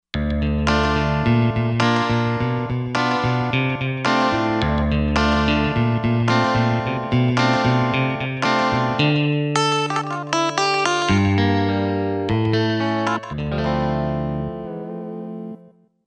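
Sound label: Guitar Snapper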